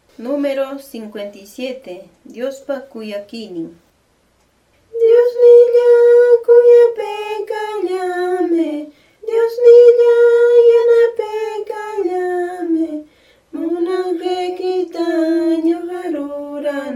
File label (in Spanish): cancion